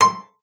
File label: FLAG C6; Xsample VOL-8; LAUTE+FLG